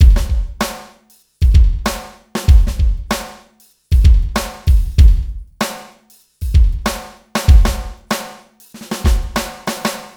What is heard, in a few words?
HYPE TYPE 96